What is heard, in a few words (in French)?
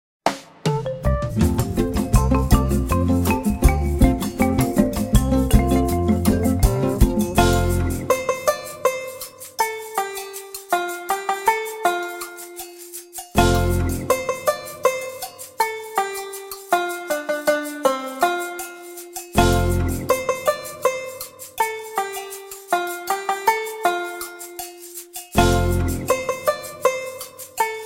version instrumentale; chansons versions play back